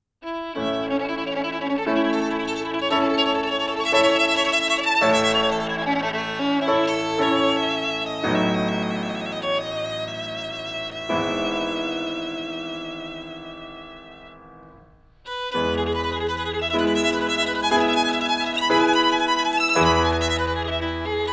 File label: Violin Sonata In A; Vivaldi